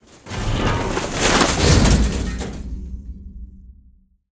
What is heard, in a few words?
metal whoosh; Metal Debris